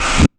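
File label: Flange Snare Reverse; Roland SH-32; Drumset 04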